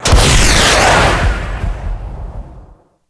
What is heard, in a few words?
bazooka fire